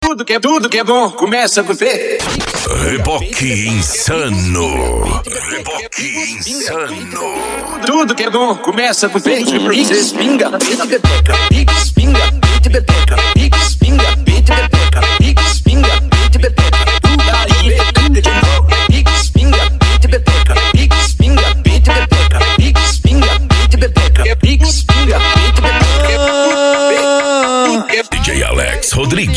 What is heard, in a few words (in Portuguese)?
noia dance e funk mega bass